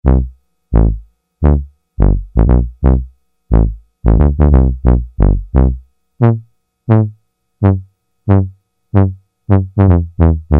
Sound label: Tuba, Roland Juno-60; Factory presets